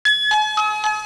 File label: tingling